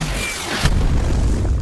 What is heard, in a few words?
hit